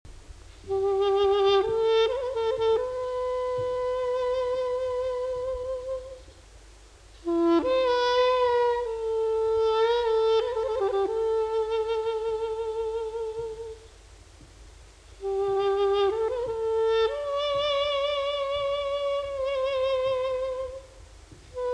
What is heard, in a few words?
doudouk